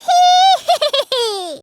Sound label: laugh; himiko